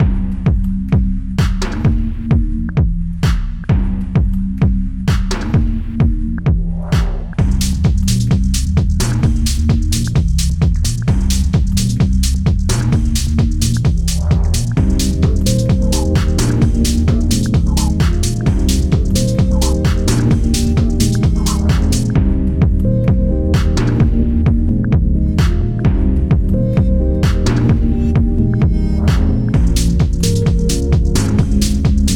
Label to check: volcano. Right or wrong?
Right